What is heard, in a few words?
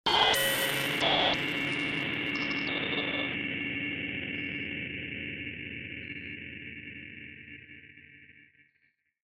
Horror Glitch Radio Signal; SoundsCrate